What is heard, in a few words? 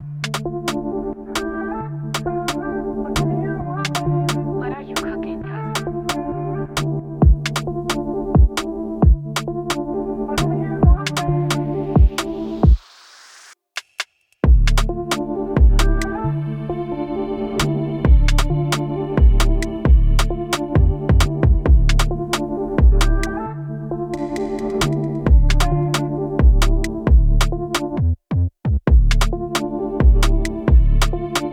Brazilian Funk Type Beat